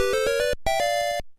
Peach Powerup, Sound after getting Peach's Mystery Mushroom